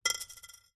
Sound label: industrial metal washer drop concrete